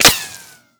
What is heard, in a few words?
ricochet; Escape from Tarkov